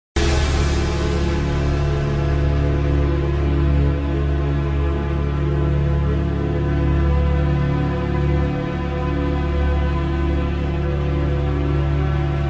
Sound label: instrumental